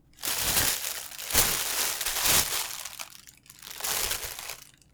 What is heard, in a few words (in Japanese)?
ビニール袋を漁る音